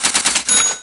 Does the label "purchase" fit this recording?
Yes